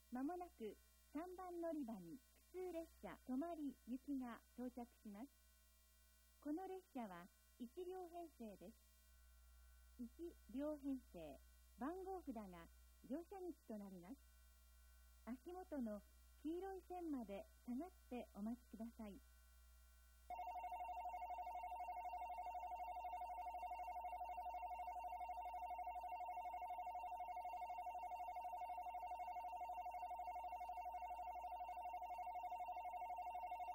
local tomari, itoigawa station; echigo-tokimeki-railway

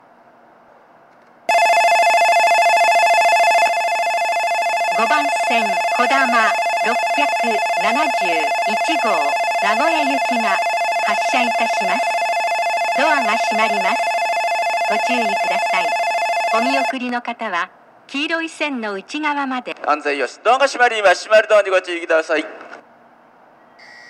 kakegawa kodama, station; jr central

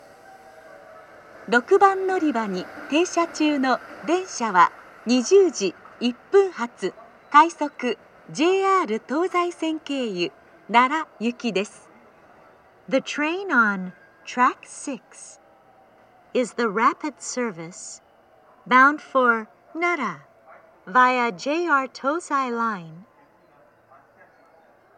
amagasaki rapid nara; station; jr-west

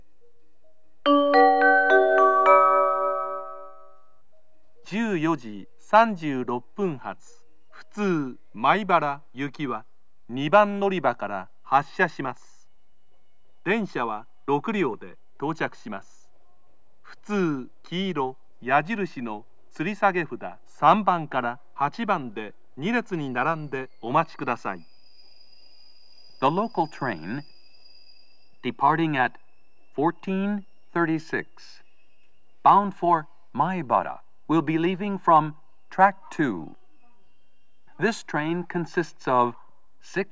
local, kyoto station; maibara

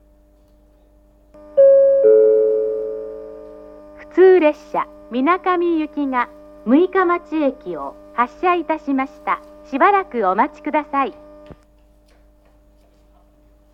local, station; shiozawa; jr-east

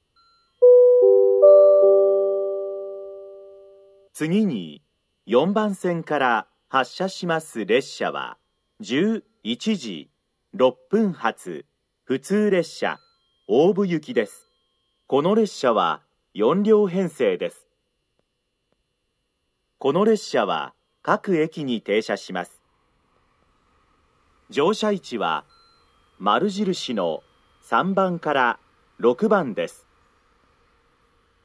biwajima local, station; jr central; obu

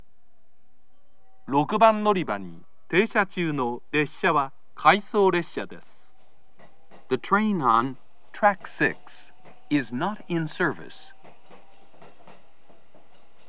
stop, kyoto, station

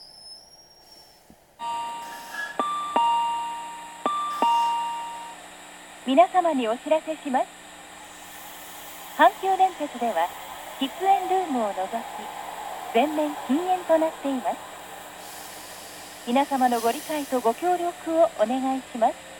kunijima, station; 禁煙に関しての放送です。

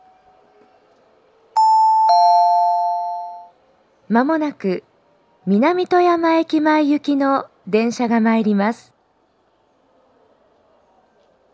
toyama eki minami toyama ekimae, station

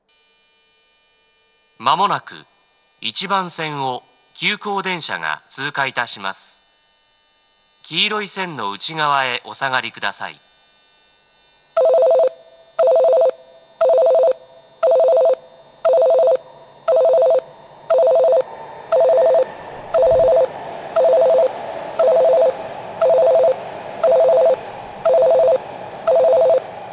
pass express; tammachi; tokyu-corporation

